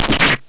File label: powerful energy gun